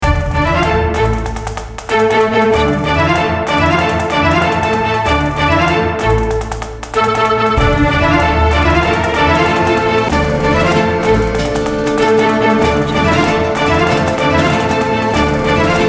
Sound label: italian march loop